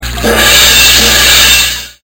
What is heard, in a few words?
Gong